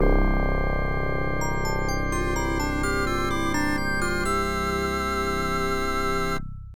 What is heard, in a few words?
Save Jingle; Shining Force; Sega; Megadrive-Genesis